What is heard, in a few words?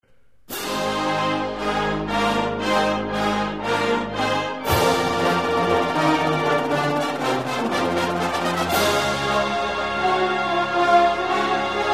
fanfare